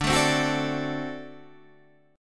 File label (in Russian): Boj D7